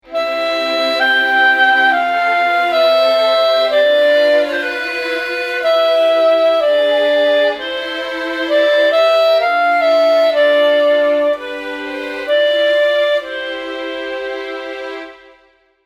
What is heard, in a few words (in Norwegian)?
arr m variasjon klar stryk